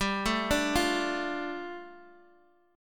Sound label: G 7th Suspended 2nd G, Guitar Open D